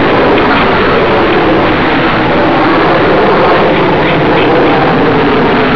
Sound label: airplane